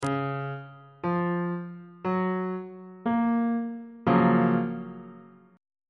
f sharp major 7th 2nd inversion on bass clef

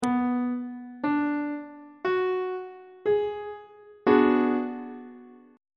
c flat minor 6th chord on treble clef

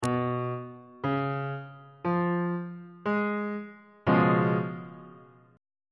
d flat dominant 7th 3rd inversion on bass clef

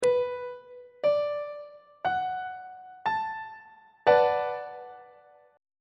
d major 6th 3rd inversion on treble clef

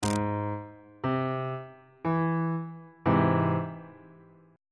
g sharp augmented chord on bass clef

